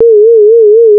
vibrato

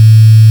bit Crusher

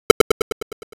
feedback Delay

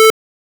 one Pole Highpass

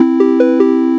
poly Synth

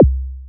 membrane Synth

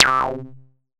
chebyshev

